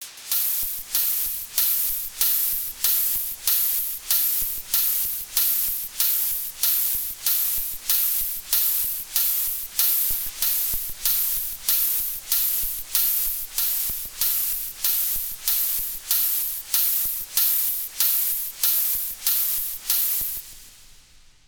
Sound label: Sweeps